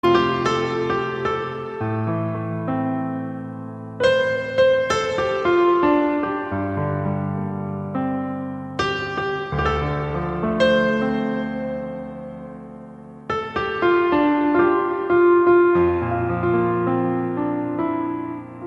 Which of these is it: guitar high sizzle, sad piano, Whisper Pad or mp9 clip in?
sad piano